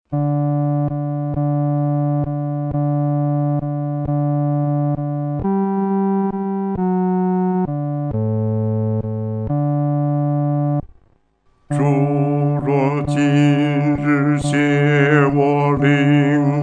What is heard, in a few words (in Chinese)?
独唱 第四声